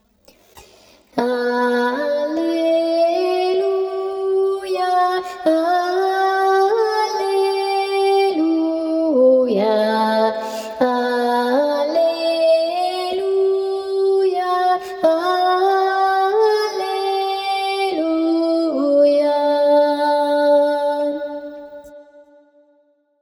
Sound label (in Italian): alleluia irlandese contralti